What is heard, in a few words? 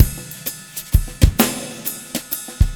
drums six8 130; Quazibeat; Rock Heaven Drums